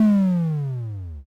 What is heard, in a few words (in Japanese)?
zuun